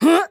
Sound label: flyer attack